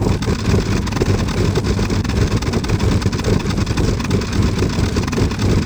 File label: Aircrafts Engines Packard Merlin Prop Cockpit Ex R In; DCS